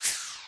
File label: window slides; Allegiance